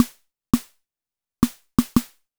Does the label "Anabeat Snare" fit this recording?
Yes